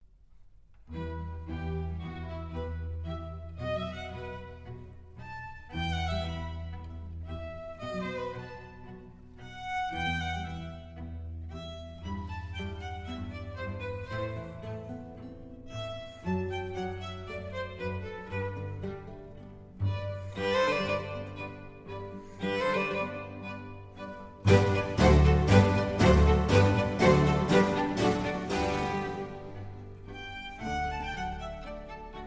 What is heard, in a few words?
Concerto for Strings in E Minor, Andante; Vivaldi; Concerti per archi II; Concerto Italiano